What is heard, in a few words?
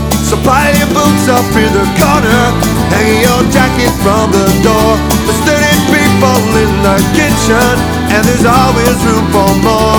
folk